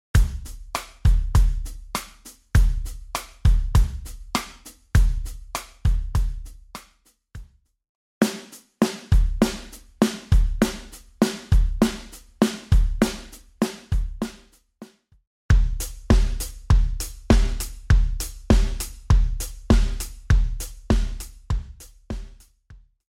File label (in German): Drumbeats; Hoertraining